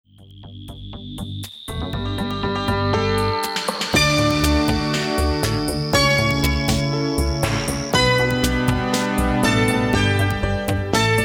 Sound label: Dulcimer